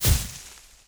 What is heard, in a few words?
Leafy Explode; Hollow Knight; Moss Charger